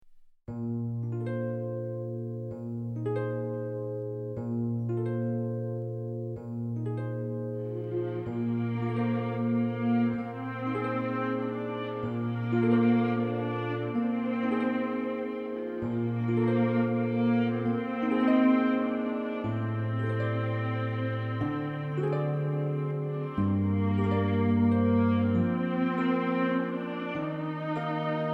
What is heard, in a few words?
Violas Romance; Doodles; Violas B: (Romance)